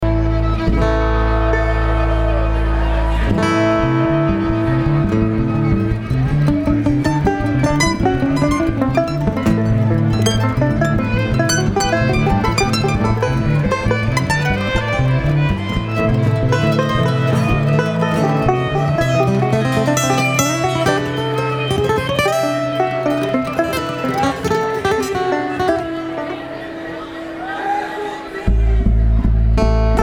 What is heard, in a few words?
Traffic Jam